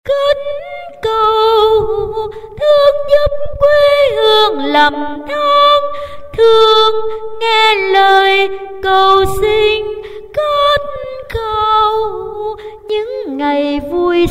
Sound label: tenor